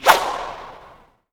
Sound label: whoosh out